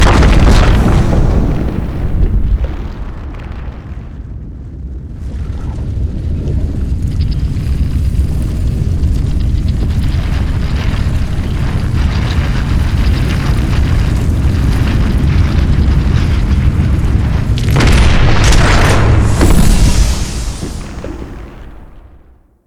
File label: stair extend; odin